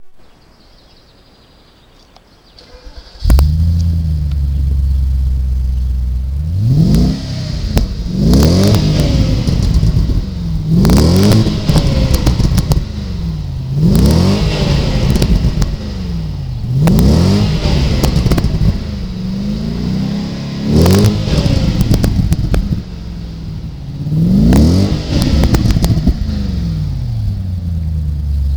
Audi A8

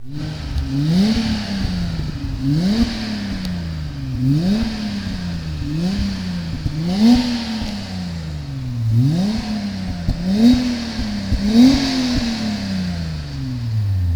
Audi A6 C4

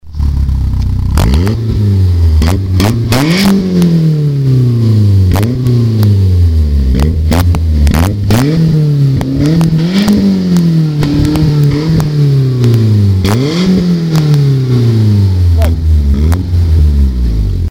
BMW E30 M3

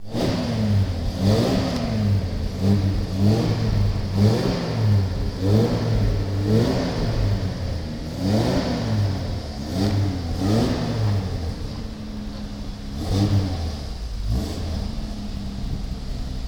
Bentley

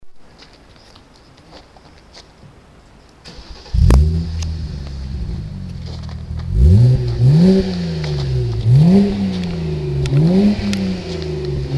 BMW 1er 135

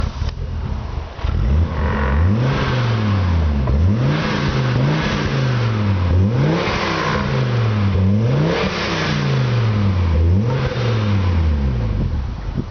Audi Urquattro

